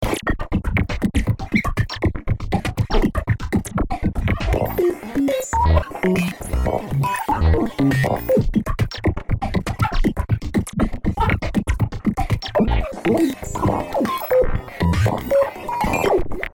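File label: Beats, The original wave is a drum loop with a human voice, but by using the Step Mode and the arpeggiator in combination, an experimental beat is created.; V-Synth; Chuckle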